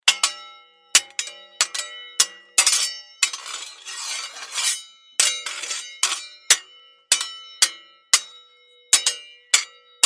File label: Sword Fight Pirate Cutlass; Warfare; Swords (Sound Effects:Combat); Blastwave